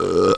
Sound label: belch